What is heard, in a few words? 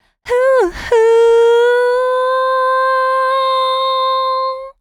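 Adlib, woman; женщина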